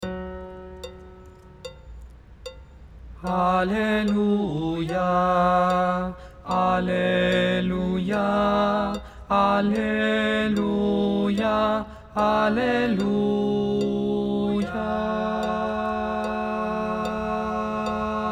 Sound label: Aleluia tenor